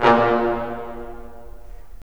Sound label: BRS, Roland LA Composer